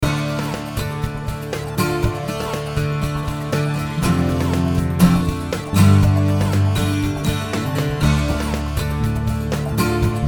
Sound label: dry